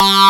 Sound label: Sync G3, lead; Multisamples; Digital Sound Factory Xtreme Lead